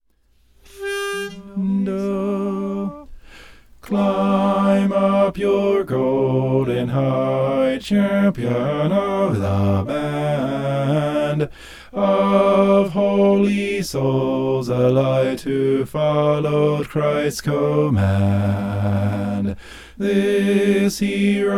bass

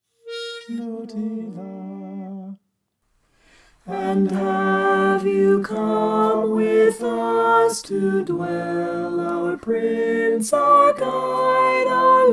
soprano

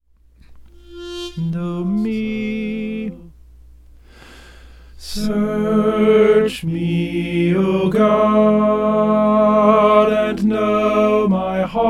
search me o god soprano

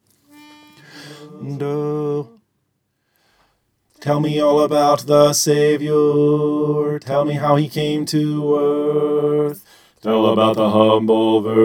bass

